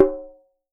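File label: AFRICAN B, JUN JUN BONGO; Voice Crystal - Steve Reid Definitive Percussion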